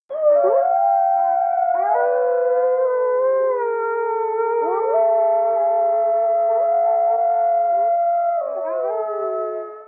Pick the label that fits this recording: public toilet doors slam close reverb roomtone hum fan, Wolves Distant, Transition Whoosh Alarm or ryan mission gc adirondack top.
Wolves Distant